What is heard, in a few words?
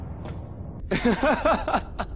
laugh; Kingdom Hearts; Riku